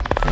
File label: Minimal Perc; Minimal Percussion